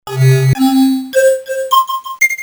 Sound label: amiga call; commodore amiga